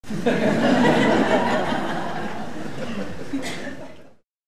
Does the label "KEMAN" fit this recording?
No